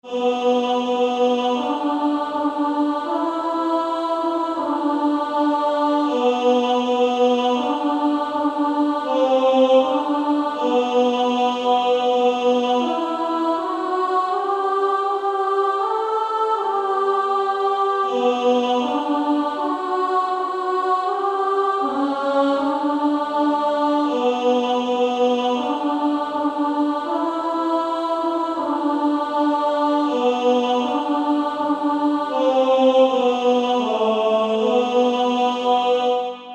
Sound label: Female Choir; 1st Alto; SSAA